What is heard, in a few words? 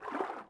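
water walk, footsteps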